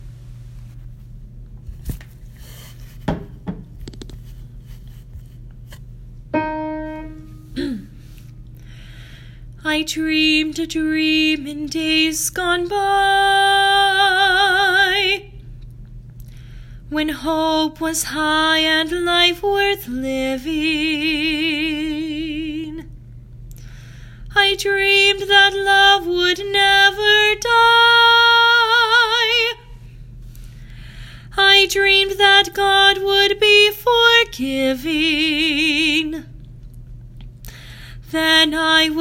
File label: Alto Recording